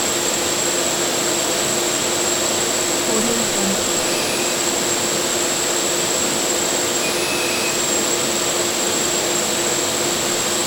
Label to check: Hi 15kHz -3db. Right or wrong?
Wrong